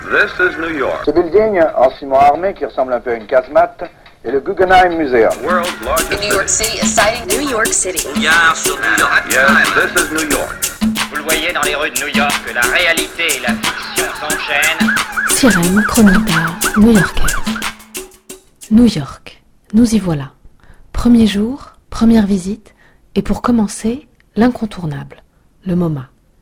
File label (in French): sirene